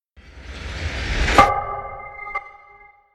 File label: Modern Tech Logo